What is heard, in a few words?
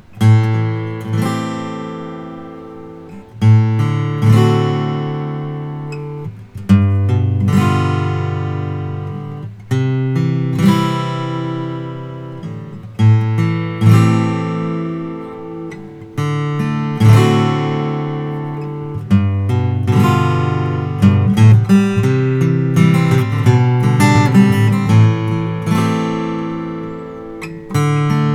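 charis sj engelmann spruce natural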